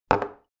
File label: Glass plate setting down on a table